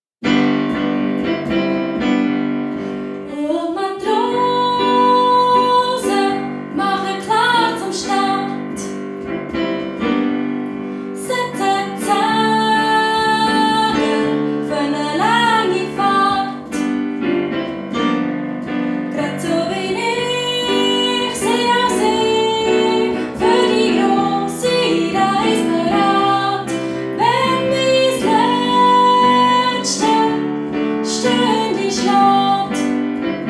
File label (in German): audio 3 stimme